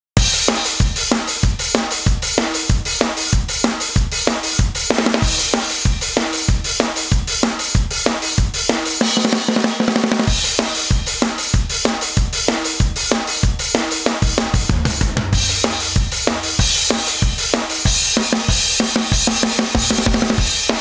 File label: Drum